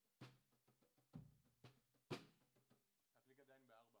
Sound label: hi hat, 96bpm